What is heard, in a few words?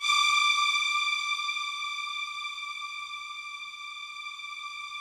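Rough Strings D5, Yamaha VP-1; 90 s Sample CDs; Music Store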